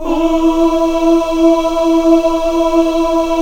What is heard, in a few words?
CHOIR OOS E 4; Spectrasonics; Heart of Africa 1